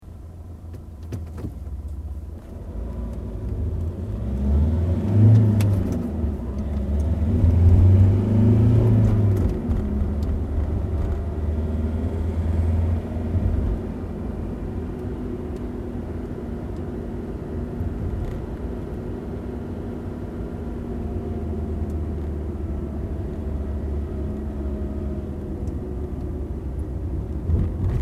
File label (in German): fahrt lieferwagen innen